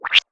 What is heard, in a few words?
hedge hurt